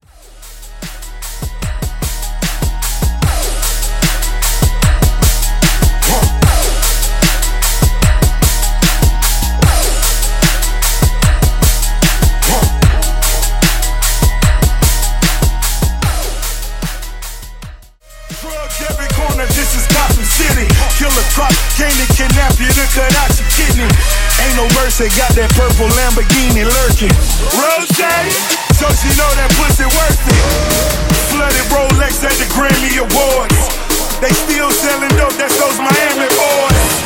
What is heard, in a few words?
Dubstep Mashup; Remixfreakz